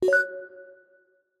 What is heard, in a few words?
Cyber